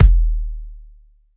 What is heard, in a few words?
long kick drum sample d sharp key; kick drum samples